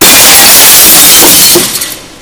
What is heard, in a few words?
glass; svencoop